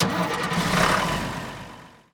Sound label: vehicle frontloader ignition; teardown ps5 windows xbox series xs gamerip 2022